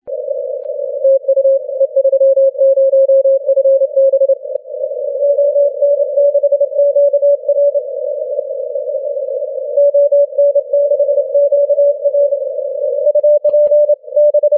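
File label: 15m continuous wave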